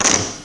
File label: Chips on Chips on Rack; iPoker 2000